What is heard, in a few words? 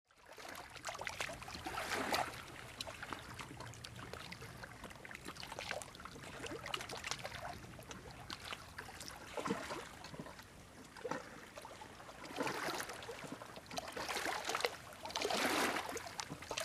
Small waves; sea